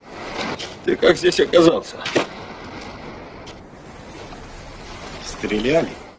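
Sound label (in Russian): стреляли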